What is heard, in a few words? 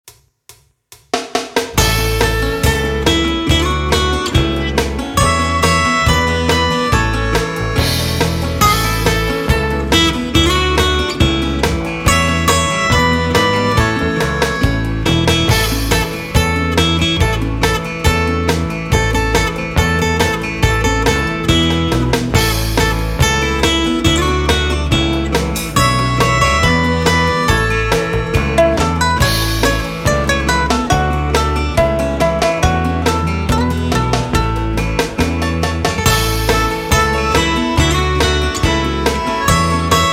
ukulele